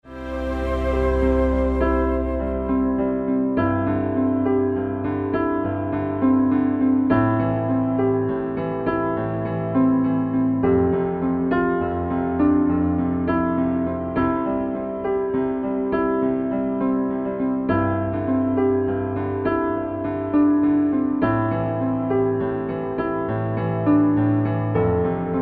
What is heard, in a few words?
Classical